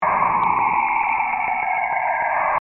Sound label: spooky saturn beat; NASA; interstellar cabaret